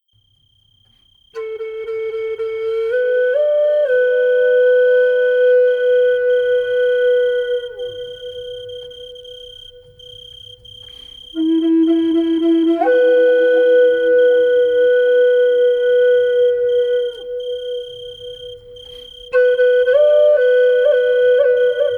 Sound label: Cricket